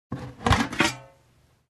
Ice buckets

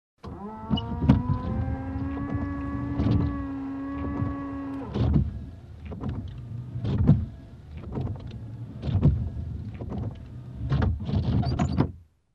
Windshield Wiper washer fluid

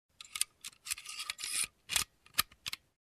Construction

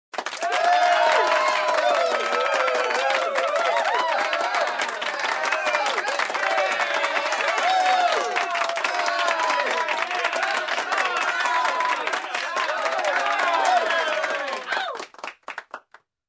People Cheering in an enclosure

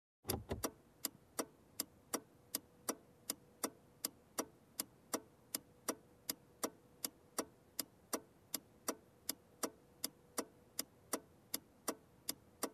Car blinker

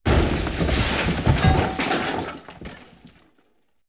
Explosion with debris scattered

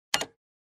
Cash Register button press with beep

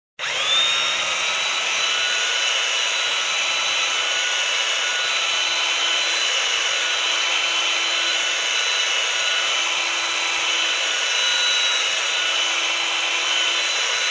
Construction

